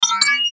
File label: Chime Multimedia; Web Interactive; Chimes (Sound Effects:Multimedia); Blastwave; Technology